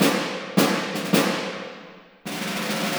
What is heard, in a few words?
Snare D; Музыка для фильмов